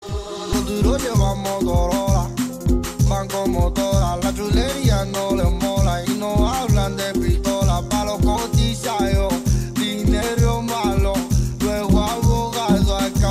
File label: motorola moto remix